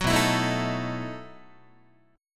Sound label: B 11th Guitar; strummed; 9,7,7,7,7,8